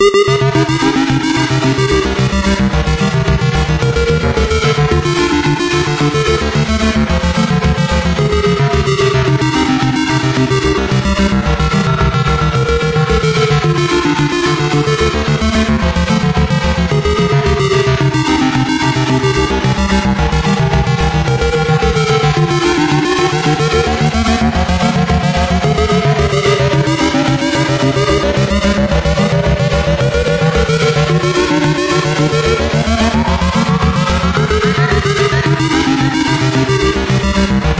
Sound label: space arp journey; SYNTH AND ELECTRONIC LOOPS